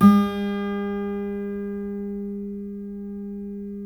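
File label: GUILD 12-string, Guitars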